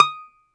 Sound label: Domra D#5